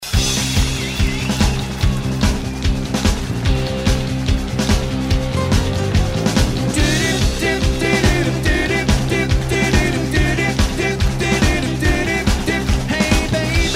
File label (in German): bayrische band